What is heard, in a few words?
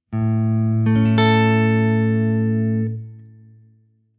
a major triad